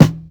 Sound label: strong bottom end snare drum d sharp key